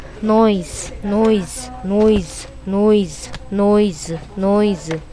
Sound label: noise